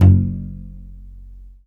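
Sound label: Contrabass Balalaika, Plucked Strings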